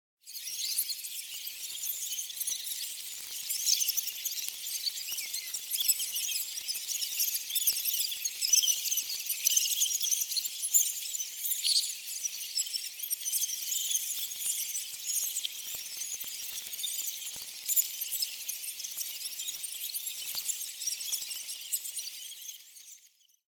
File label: Rats Ambience - Many Rats Sewage, Twittering, Fast Moving; Hollywood Edge; sound designer's toolkit v2